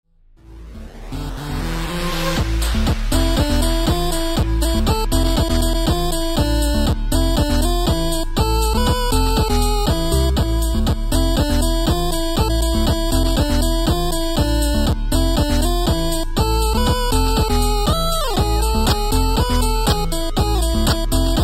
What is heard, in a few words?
8 bit